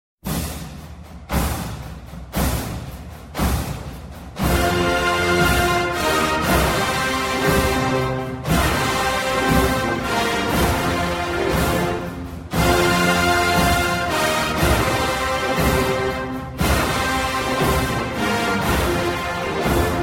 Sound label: Inspire Tone